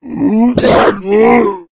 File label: zombie growl; attack